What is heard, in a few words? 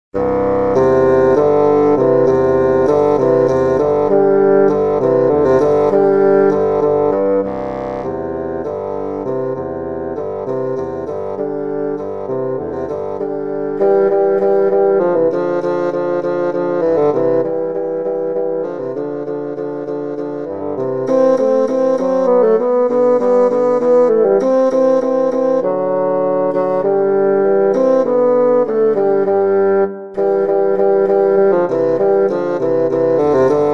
Hornpipe